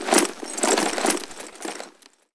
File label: tnt setup